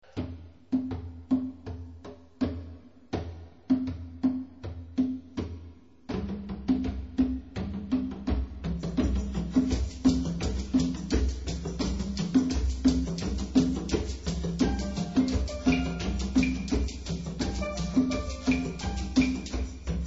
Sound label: Steeldrum